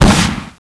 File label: shoot, paintball